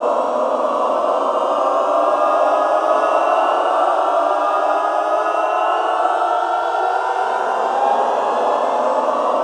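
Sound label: Glissando ENDL, Classical Choir; Best Service; Extended Classical Choir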